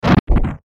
Stutter Glitch Static Low, Stutter Electrical Short